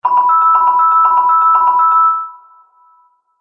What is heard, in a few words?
Collect Prize Motif, video game sound effects